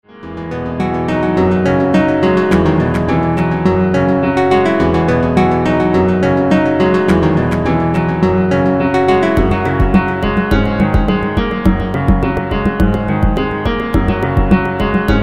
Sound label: Hot Piano